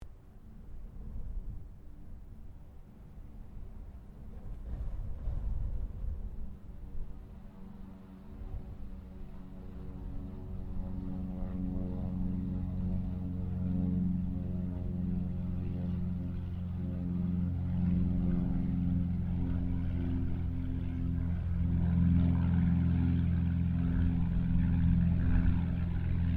AIRCRAFT CT4A PASS DISTANT, military trainer aircraft; Airplanes (Sound Effects:Vehicles)